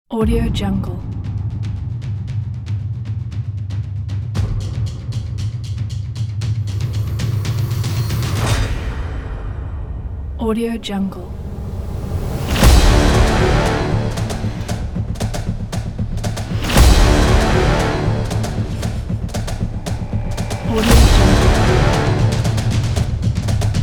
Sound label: the sports game; positive-sound